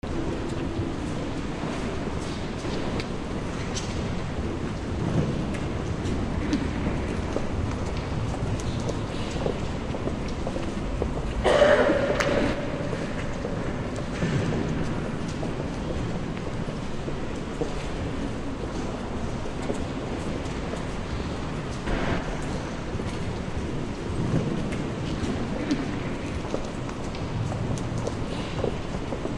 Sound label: church interior with people